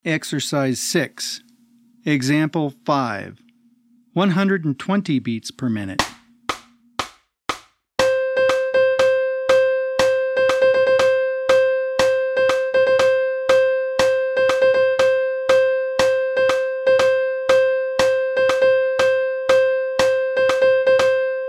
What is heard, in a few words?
120 BPM